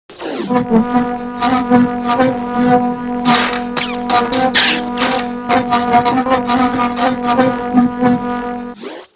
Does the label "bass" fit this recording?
No